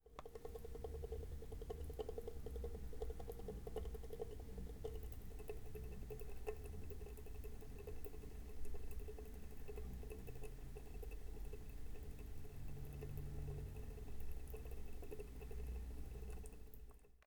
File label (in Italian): rubinetto sgocciolante